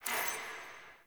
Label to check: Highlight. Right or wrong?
Right